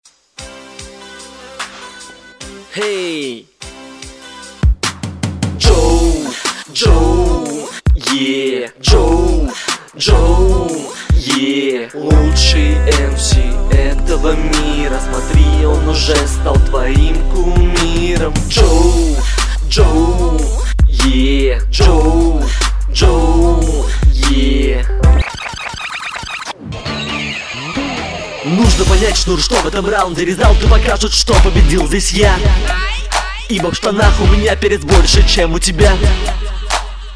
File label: rnb; battle1 round4